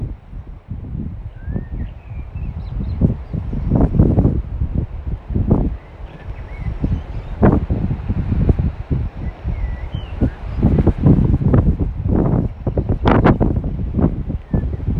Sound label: Bird call and wind gusts at F Break Cape Paterson